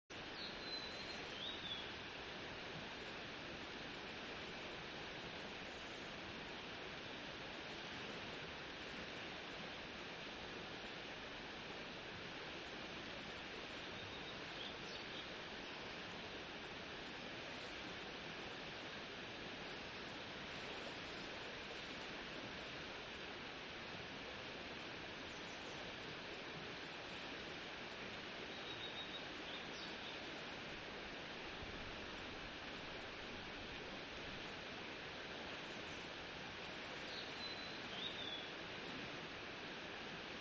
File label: riverside